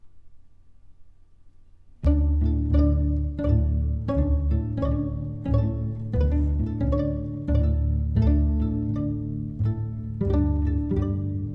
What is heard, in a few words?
Pizz orch; French